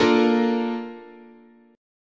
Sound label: Bb Major 7th, chord, guitar